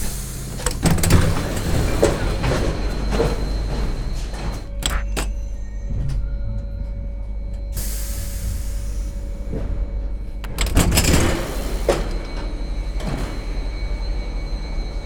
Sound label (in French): Porte Metro, Paris metro; French